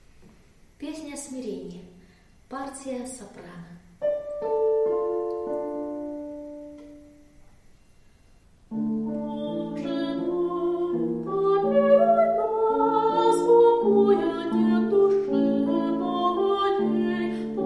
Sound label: soprano; Pesnja smirenija